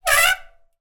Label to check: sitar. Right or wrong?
Wrong